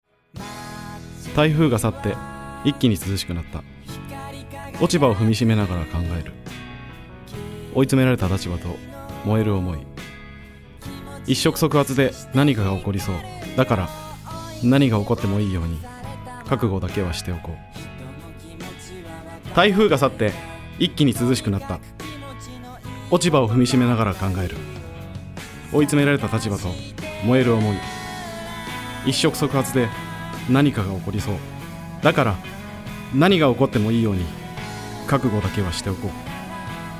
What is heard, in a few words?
bass